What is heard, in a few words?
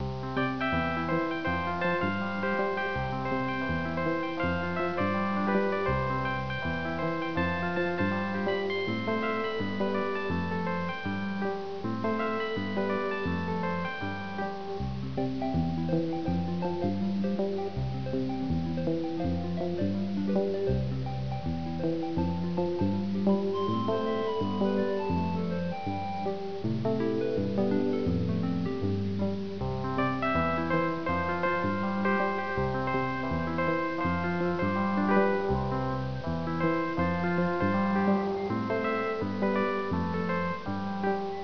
Unused Music; Airport